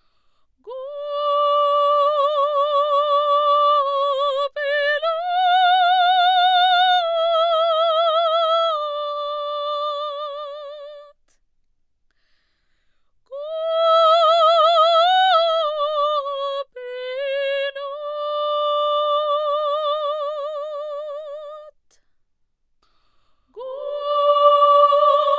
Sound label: opera, theater stage, dry